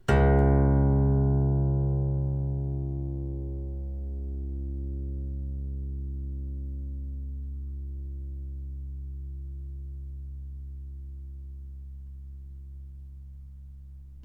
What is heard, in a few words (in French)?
Longueur 65 cm Masse lineique 0 11 g Tension 90 N, corde vibrante; Etude d une corde vibrante